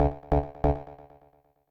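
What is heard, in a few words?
synth electro 140 A; Techno; Keys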